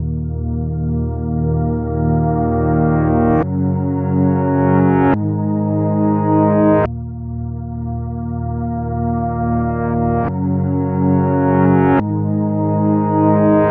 Rhodes; KJ Sawka; Drum n Bass & Beyond

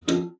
LPLK D#2, SPINET; Xsample VOL-7

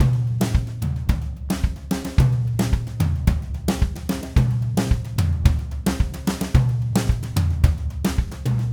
Red Maple Groove 110, DW Red Maple Kit

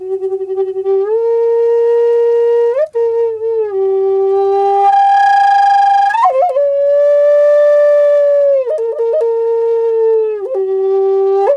G WILD, FLUTE WILD; Big Fish Audio - Didgeridoo and Other Primitive Instruments

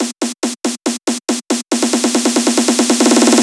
Fills, Loops 140 BPM

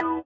SITAR; Spectrasonics; Metamorphosis; INDIATEK

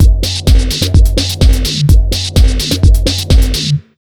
127 BEAT, Disco House; USB Soundscan vol.20; Fresh Disco House I; AKAI

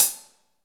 HH, Kits, Rev

